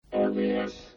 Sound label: jingle robot; Le Hit des Clubs avec Stévie Mallone nov 90